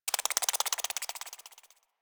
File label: Critter Scatter Clicky; Ambience; Misc Outdoor; Warframe; Infested Eris